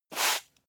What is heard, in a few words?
broom sweep single